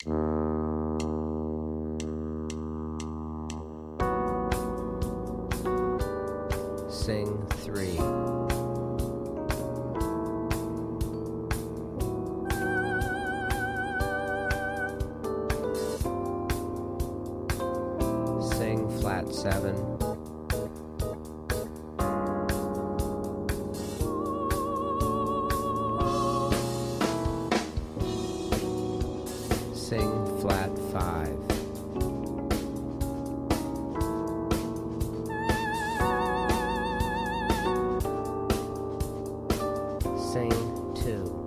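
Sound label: Eb Blues Slash Chords No Bass Soprano; slash chord ear training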